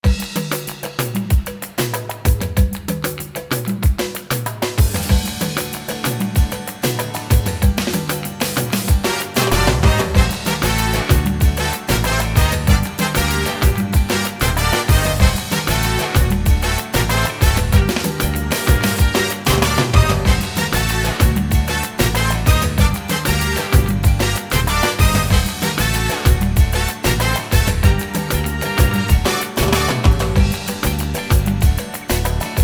LATINO FIESTA, SINGBACK

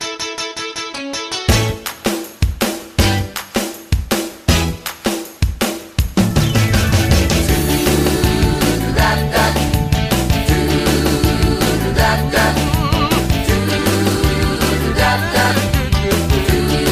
ROCK AND ROLL; BULGARIAN

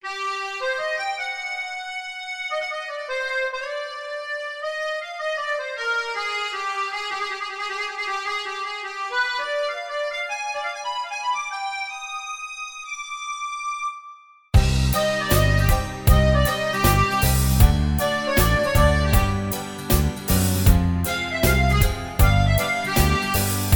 AKORDEON, SINGBACK; BULGARIAN

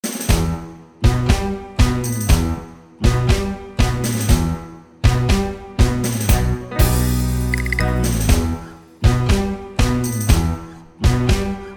TANGO, SINGBACK; BULGARIAN

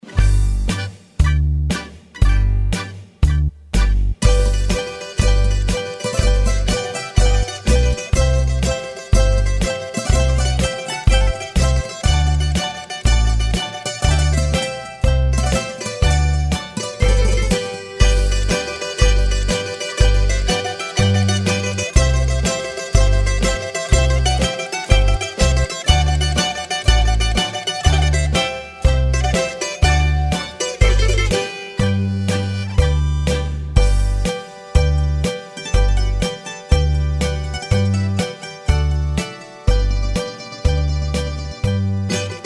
SIRTAKI SINGBACK; GRECIAN

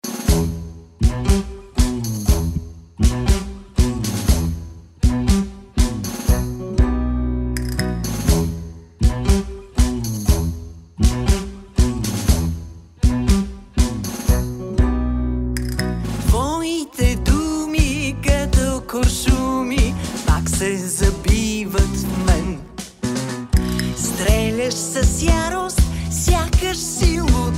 TANGO; BULGARIAN

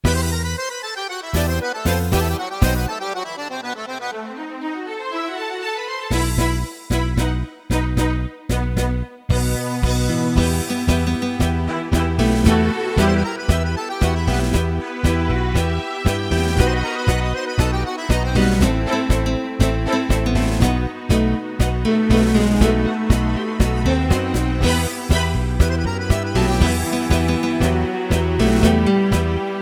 EXPRESS TANGO; BULGARIAN

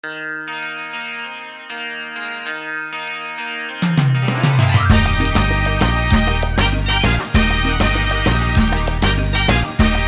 RUMBA, SINGBACK; BULGARIAN

